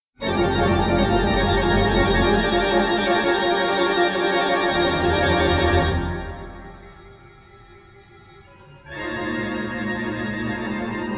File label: trills